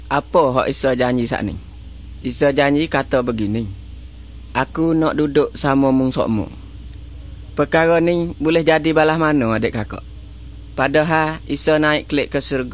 songs malay pattani

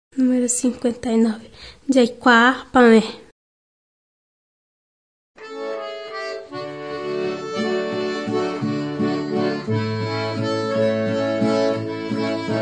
songs guarani mbya

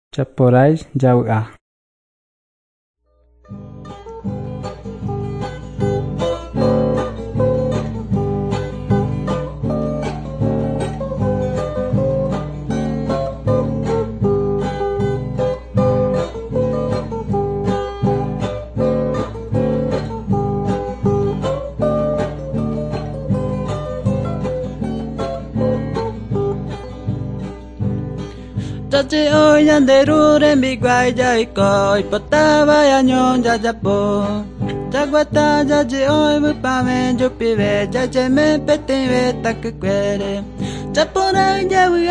songs guarani mbya; bible

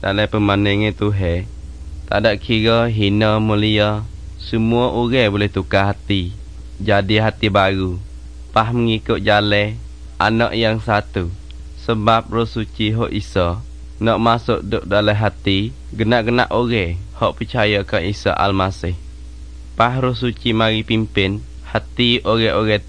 songs malay pattani

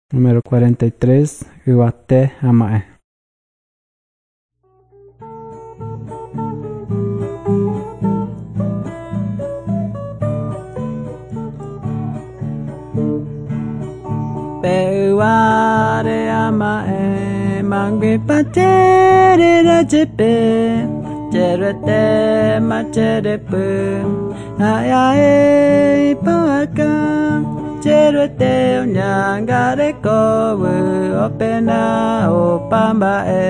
songs guarani mbya; bible

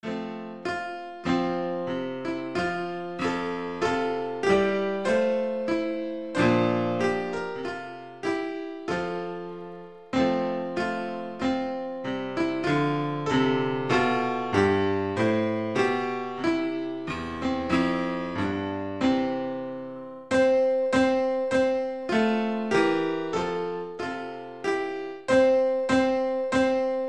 instrumental hymns; hark! the herald angels sing

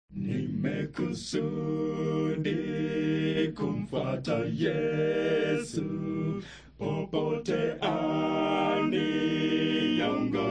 songs swahili kenya

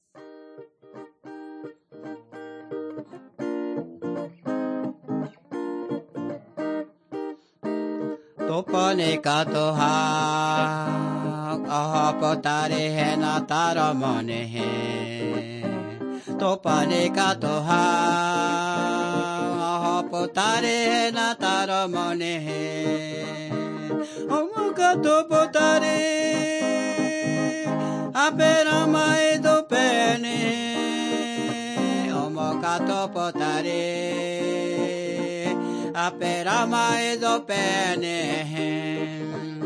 singing to god

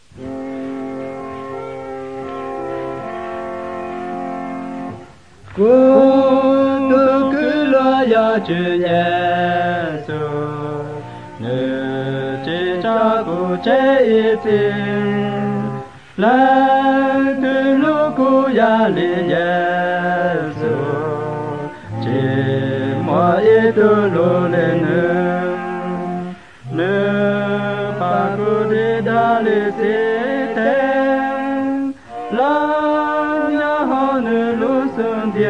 songs hmong white; bible

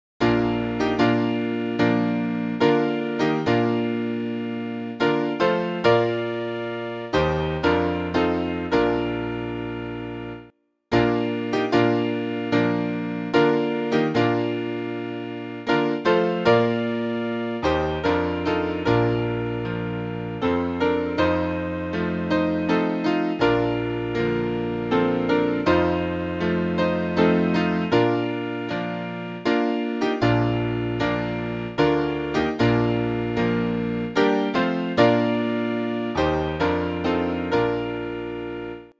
rock of ages, instrumental hymns

